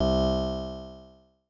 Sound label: synth poly c2